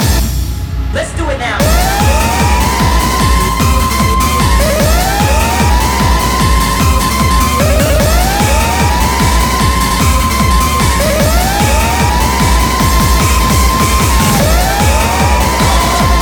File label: J-Core